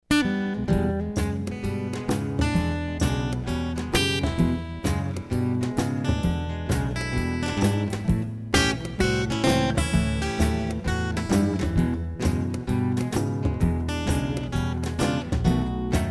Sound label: Acoustic Blues